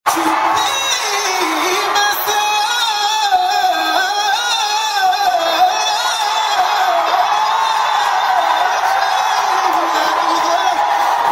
hits amazing note

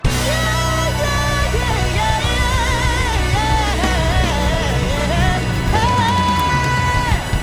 Run 25 notes yeah yeah yeah ee

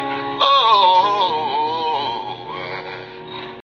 low descending

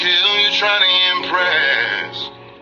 low impress

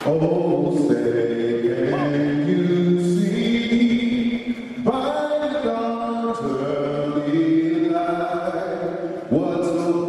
Anthem without talking

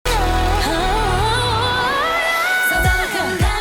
hoh oh oh yeah

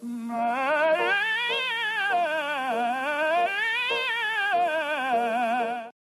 Vocalizing

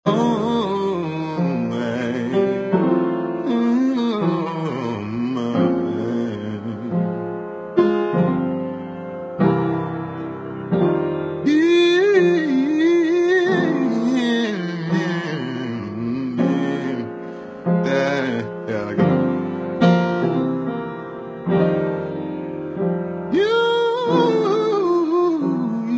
R B Piano Shed

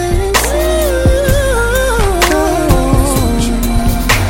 woo oo oo

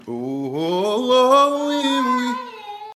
low ascending

